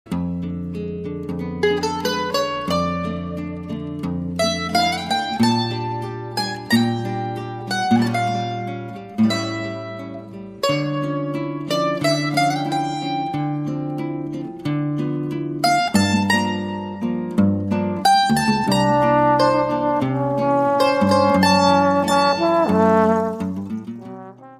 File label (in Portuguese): canto